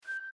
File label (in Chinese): 点击音效